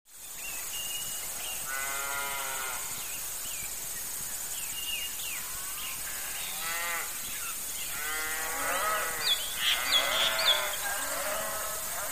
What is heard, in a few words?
Cows; Cows In Pasture with Birds; cattle